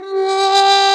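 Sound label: Plunger Mute, Brass; Roland L-CD702; Plunger & Wa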